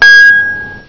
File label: GLASS BEL; bayarea; SFMuni